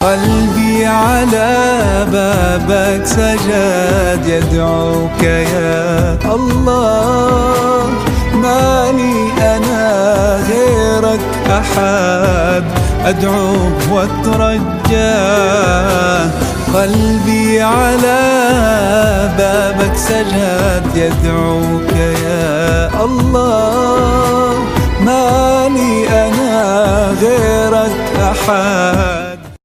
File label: arabic ringtone